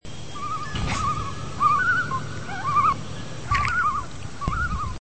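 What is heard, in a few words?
chirring of loon with sound of splashing waves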